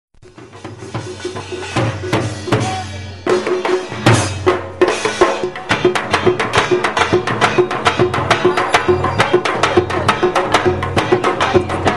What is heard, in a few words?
street drums, Field Recordings from India; WFMU